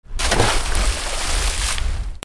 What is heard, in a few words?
WATER